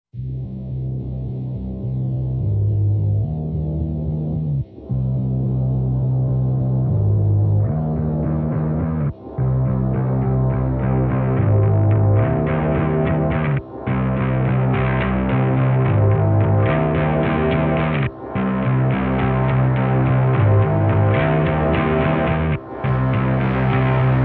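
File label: Mainframe No Drum No Bass Wah, trailer music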